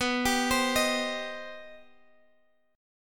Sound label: C Minor B; Cm/B arpeggiated